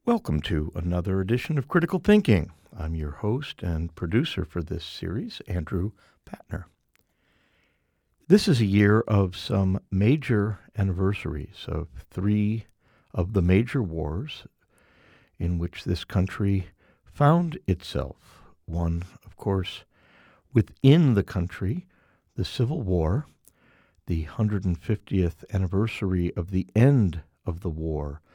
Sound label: Some WWII era Instrumental Jazz